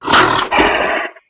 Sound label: baron voice; doom4